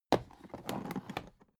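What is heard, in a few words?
aluminium case small handle drag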